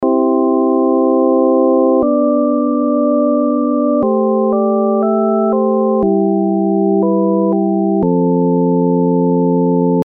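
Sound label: Envelope Organ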